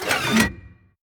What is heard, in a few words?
gun reload; World of Tanks